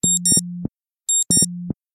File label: Alarm Beep; Motorola; Edge 70 Max China; alarms